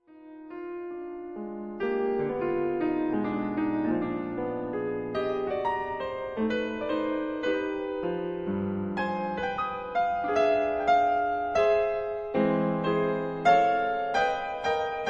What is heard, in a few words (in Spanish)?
Piano mano Izquierda; 3 obras mano Izquierda; Berceuse Oscar Espla